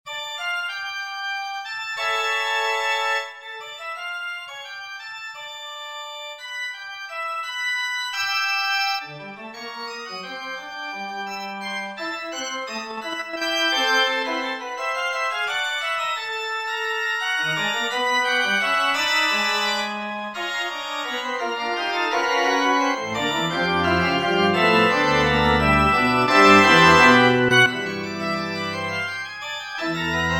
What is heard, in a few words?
Fugue